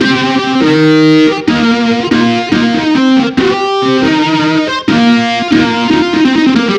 Metal Monster, Keyboards

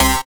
Synths Root C; Vengeance - Essential Clubsounds Vol.4; Synth Shots and Basses; Note C

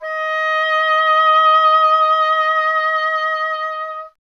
WND OBOE, Solo Instruments; Miroslav Vitous - Solo Instruments VOL-2

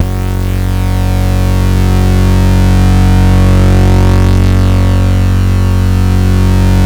ACID CUT BASS; Masterbits; Vintage Voltage

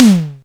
DRUMTRAKS, classic machines

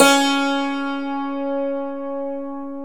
DULCIMER C#3, Stereo Dulcimer

